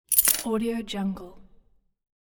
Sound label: coin collect